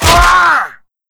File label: body medium impact hard